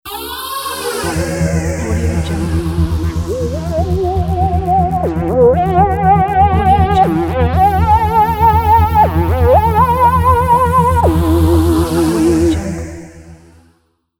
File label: fantastic space intro; cinemultic